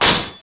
SWORD; LORDS2

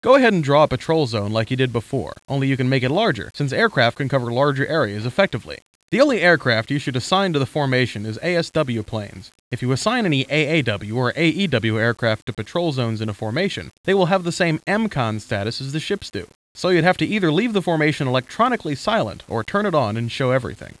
HARP

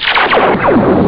start; Viper

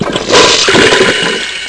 FLUSH IT

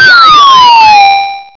WIZARD

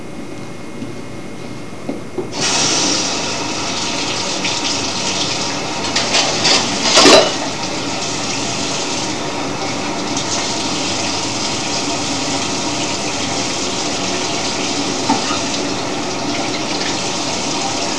WASHING

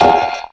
SPRASH, CARTOON

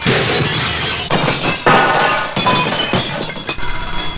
CRASH BRN

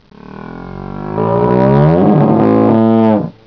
moo

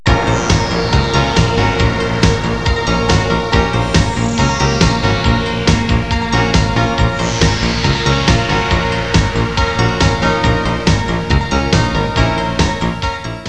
MUSIC

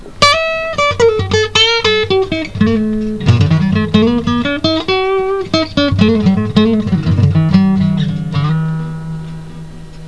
blues

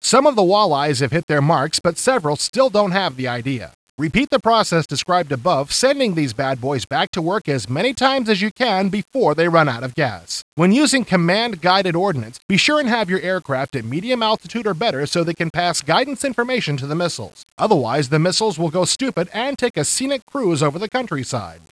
HARP

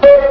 boop

